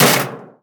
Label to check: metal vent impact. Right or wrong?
Right